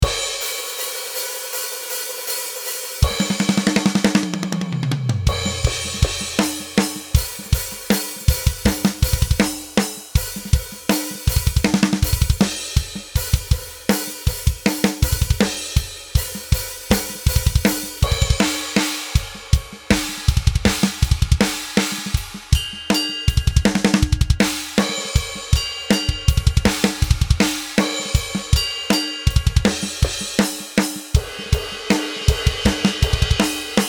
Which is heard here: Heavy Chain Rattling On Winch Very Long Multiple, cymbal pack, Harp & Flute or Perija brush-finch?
cymbal pack